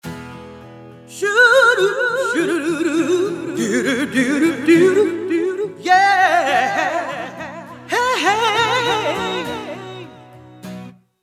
BOSS VE1 ENH Green Delay

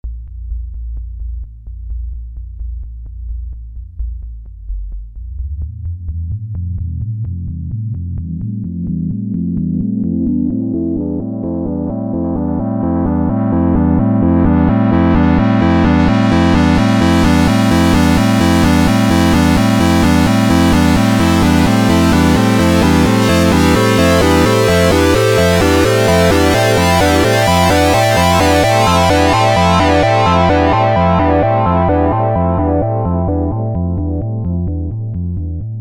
Pseudo PWM

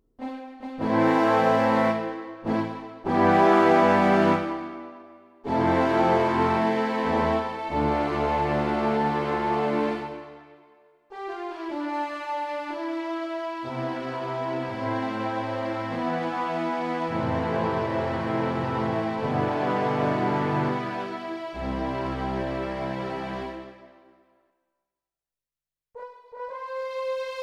Strings

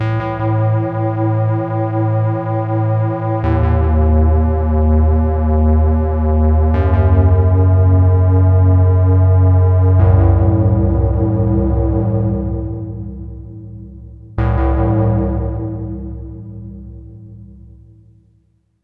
Modal Argon8

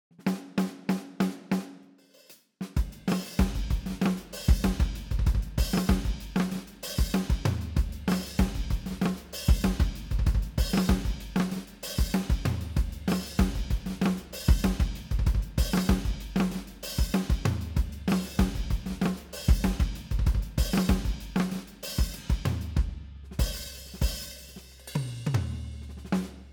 Modern White Full Funk

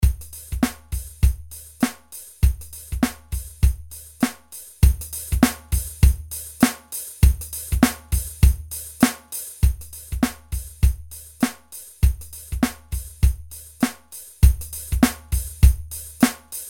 Fatso Drum Loop

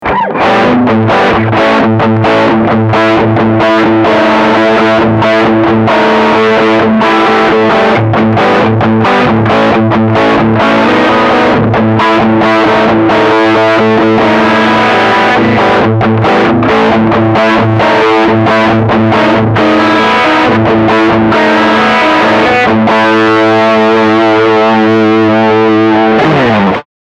Full Gain

